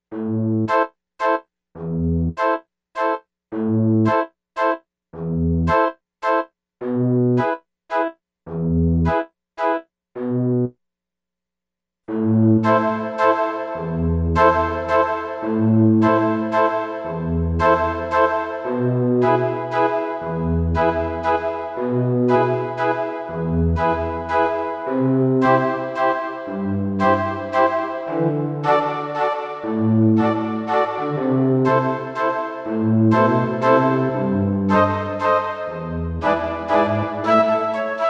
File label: LARGE ROOM